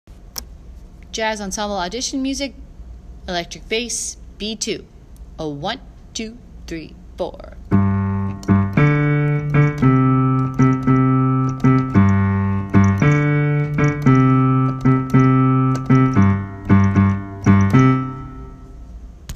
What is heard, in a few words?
bass